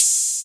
trap open high hat sample a key, Royality free open high-hat tuned to the A note